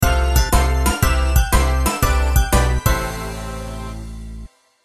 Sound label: gameover; Horgihugh and Friends